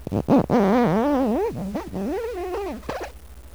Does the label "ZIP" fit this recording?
Yes